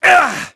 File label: GRUNT, VOICE; Pulse Racer